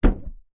vehicles car bonnet hood hit footstep bang ext

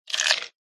cartoon bite eat crunch single

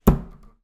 kitchen cupboard door close utility

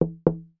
household window glass double glazed knock x2; sound effects one

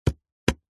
technology mixing desk digital button press

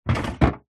office plastic file tray drawer close

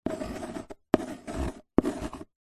office handwriting pencil draw on cardboard box

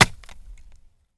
industrial tools axe chop wood; sound effects one

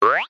cartoon bounce jump spring; zapsplat

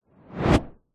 hit whoosh fast swoop

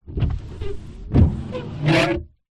car windscreen wipers dry squeak internal

